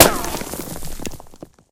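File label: ric, ricochet; Left 4 Dead; Source Engine; weapons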